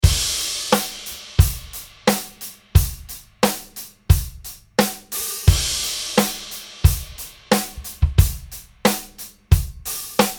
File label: Meinl 16 inch hi hats and Extra Thin Hammered crash